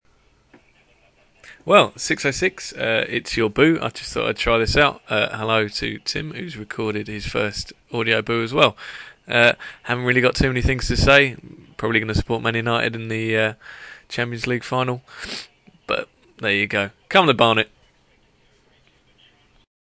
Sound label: booooo